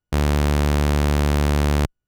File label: Ramp D1; fleet keys Samples